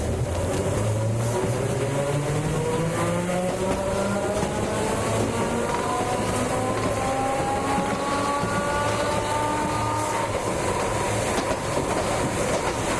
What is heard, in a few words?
streetcar go top; tetsuon